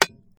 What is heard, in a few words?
fighting game source foley destruction sweetener wood hammer; impact